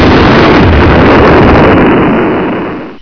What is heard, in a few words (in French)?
explose